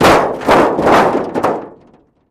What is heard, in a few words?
Car Hood Drop On Groun